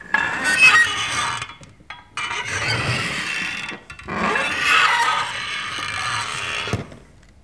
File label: Hinge Creak